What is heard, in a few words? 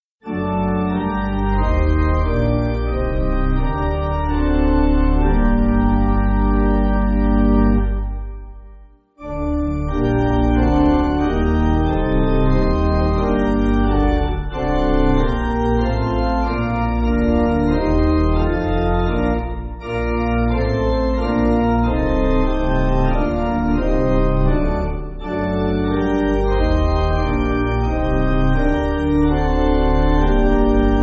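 Pipe, Pipe Organ, Backing Files for Churches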